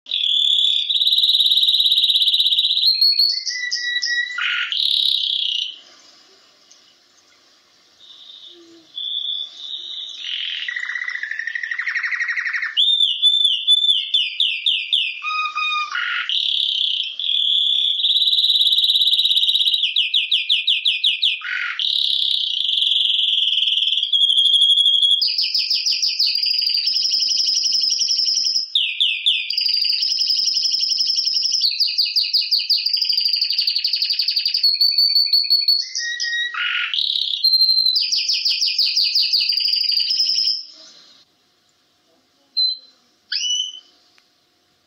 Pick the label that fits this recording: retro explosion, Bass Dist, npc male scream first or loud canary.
loud canary